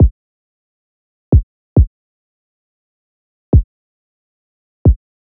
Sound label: Drum Loop - 136 BPM Kick; Loop Stems; Cymatics; Vibes II Drum Loop Collection; Zenith